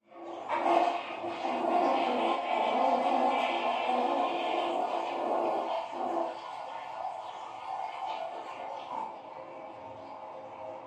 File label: Toilet Flush Water, pipes